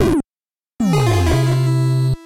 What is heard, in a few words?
Death Jing; Sydney Hunter and the Curse of the Mayan